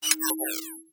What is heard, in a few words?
window close